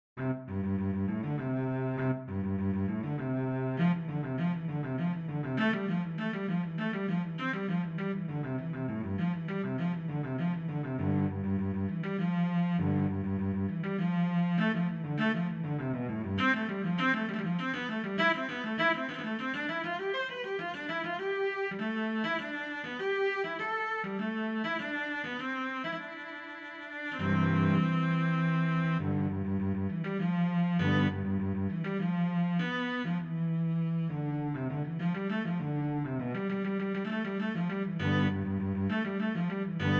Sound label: Solo VC